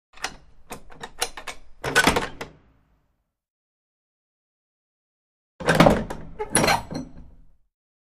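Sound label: Large Metal Door Bolt, latches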